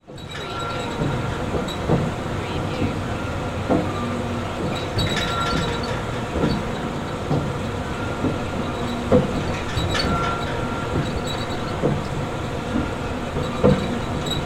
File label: Oil Rig Operate Pump